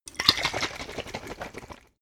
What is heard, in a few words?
bottle vodka shake foam fast; berufe; gastronomie